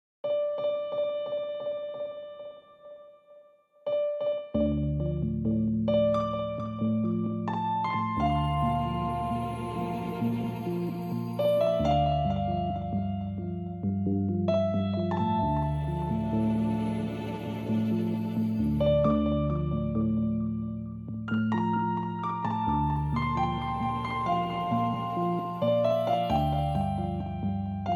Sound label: orch. mix